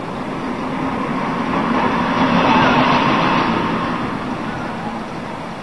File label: rollercoaster; 暴力赛车